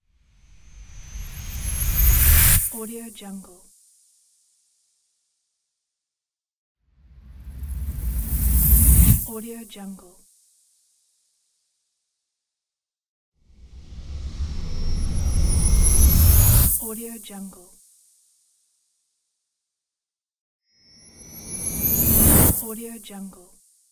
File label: bright reverse